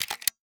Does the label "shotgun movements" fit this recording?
Yes